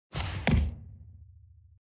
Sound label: landed on the ground